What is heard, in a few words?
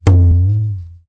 drum